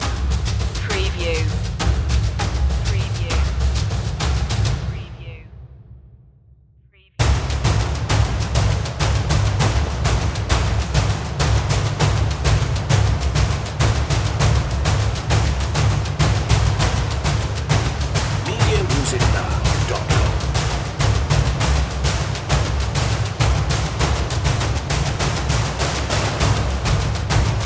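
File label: percussion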